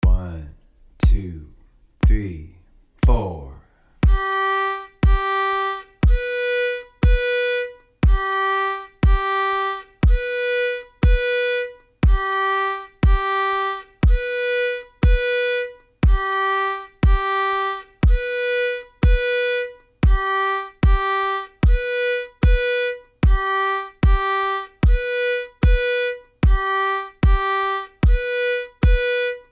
Intervals

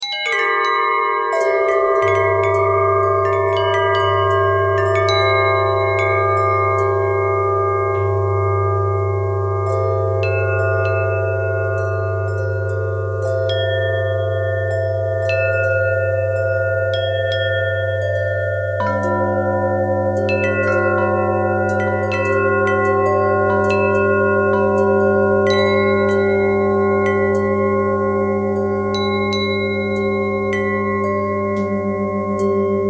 bell bell thread pentatonic, bells

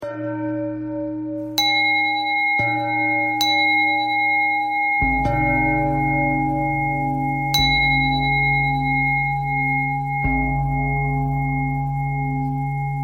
bell thread pentatonic

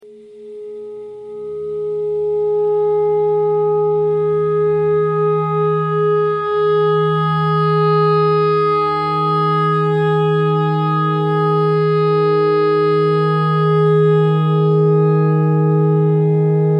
clarinet notes e clarinet notes e pentatonic

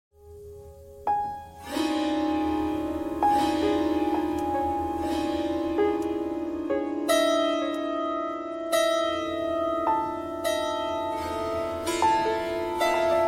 piano thread noise

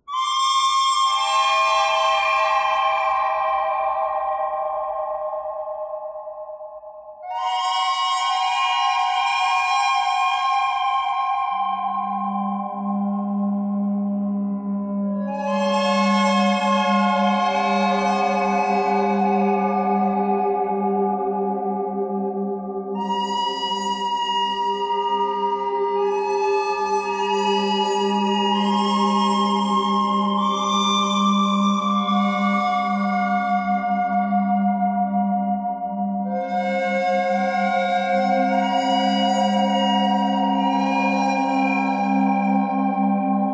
bird thread buzz all; birds

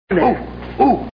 Ooh Ooh

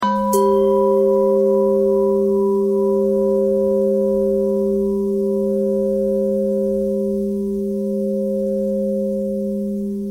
bell thread pentatonic

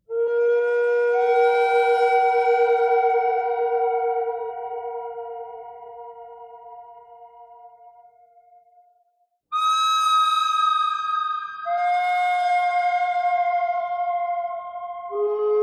bird thread noise; noreverb

